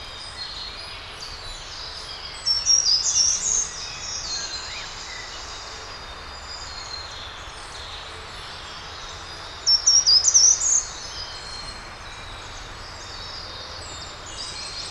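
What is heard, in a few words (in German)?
Gartenbaumläufer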